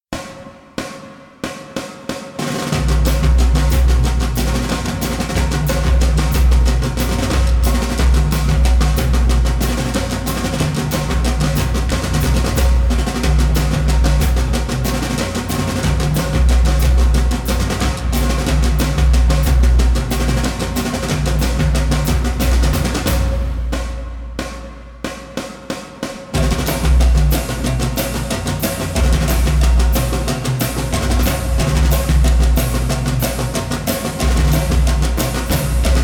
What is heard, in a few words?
Marching Band Intro